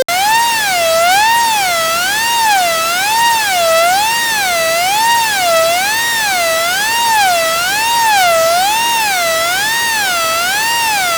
Grill Alarm

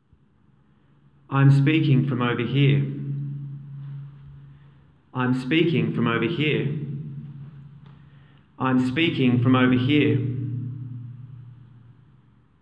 reflections

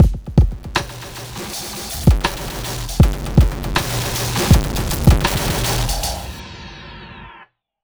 drumloop flam beat; TCM5000

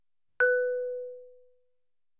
Xylophone

